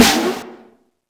big snare drum sample a sharp key

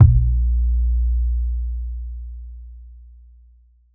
tr 808 synth drum kick a sharp key

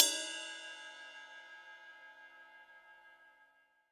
roomy ride cymbal a key; cymbal drum samples

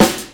prominent boom bap hip hop acoustic snare A key

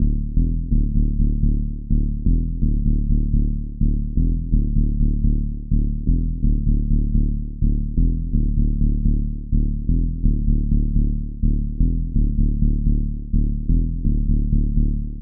punchy tech house bass rhythm edm dm 126; loops

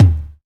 mid tom d sharp key; tom drum

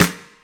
eighties trebly jazz snare drum f key

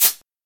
nice hi hat A key

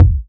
sharp kick e key; kick drum samples; drum single hits one shots

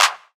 clap one shot c key; percussion; single hits one shots

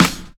smooth snare one shot f key; snare-drum-samples

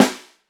bassy snare one shot c key; tuned drums c key

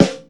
studio acoustic snare f sharp key

